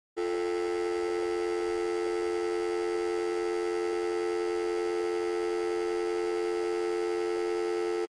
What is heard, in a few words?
Long beep when the phone is off-hook; telephone